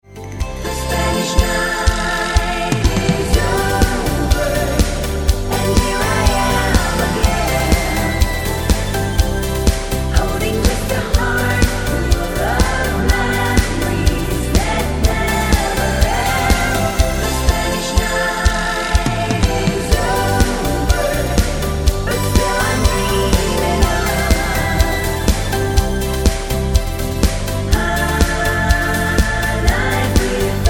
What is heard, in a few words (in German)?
mit Chor